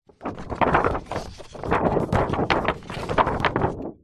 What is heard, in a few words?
hospital xray sheet handling